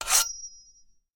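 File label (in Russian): заточка длинного лезвия мачете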